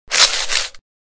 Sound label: shop buy